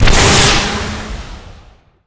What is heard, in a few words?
flyer missile fire; Quake 4 Alpha; id software